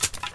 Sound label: Crate; friday the 13th killer puzzle